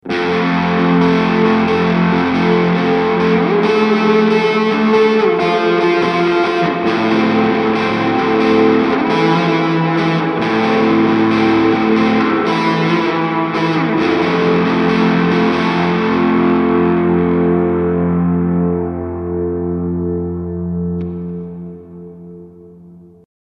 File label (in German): Fuzz vor dem Reverb